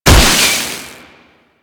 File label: Weakpoint Destroy; Warframe; Nineteeen Ninety Nine; Infested Survival